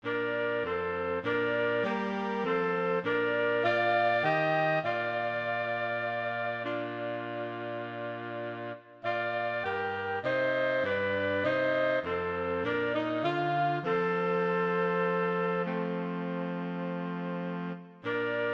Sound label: organ